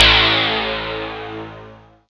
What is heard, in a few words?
SYN old casio; Best Service Gigapack 1